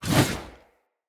Sphere Dual Sword Throw, Weapons, Swords; Player

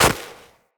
Walk Snow, Footsteps; Operator

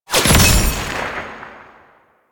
Kill Notifier; gameplay; Warframe; Nineteen Ninety Nine; Quincy

